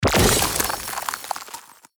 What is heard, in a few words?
freeze start; steamcommunity; saliengame